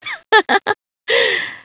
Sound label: laughter; svencoop; player sounds; lappland